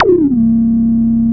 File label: DRONEZAP; Back In Time Records; Big Bang